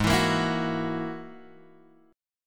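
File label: A flat 7th Suspended 2nd Sharp 5th A flat Guitar Drop D, chord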